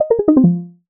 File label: power off